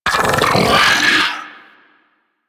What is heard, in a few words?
Arachnoid Berserk Vocal Rupture Inflate; Enemies; Vocals; Warframe; Corpus; Arachnoid Hunger